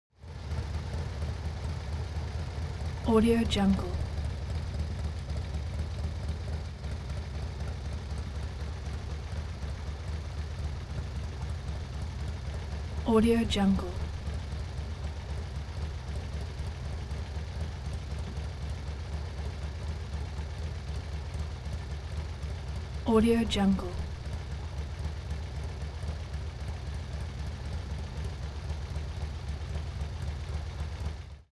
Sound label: transportation motorcycle harley idle